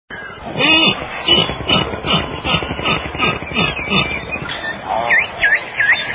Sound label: beluga calls